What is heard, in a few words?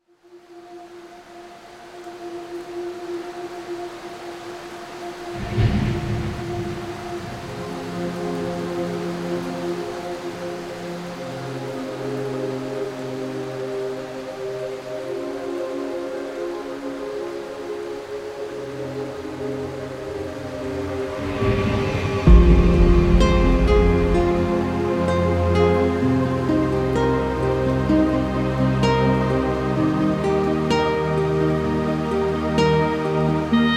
prelude